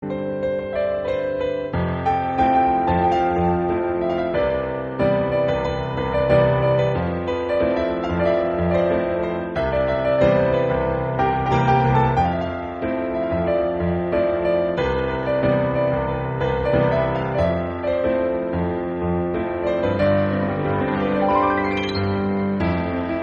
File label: instrumental